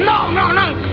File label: pain